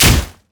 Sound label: grasping roots; LoL; zyra